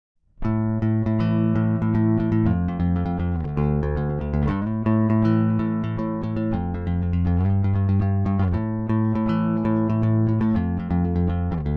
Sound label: MXR 83 Flanger